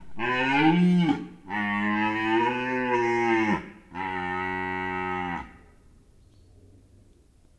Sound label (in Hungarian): gímszarvas; budakeszi zoo 2010